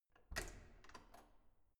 corridor door soft open

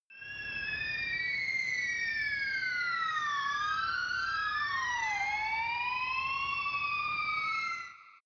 fighting racer far

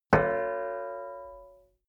plate hit ring stopped soft

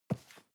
cardboard box medium grab slide; objekte

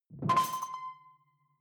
sci-fi alien console sparkle; maschinen; signale; soundpool

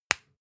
spice jar smack close

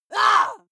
female lunatic scream short

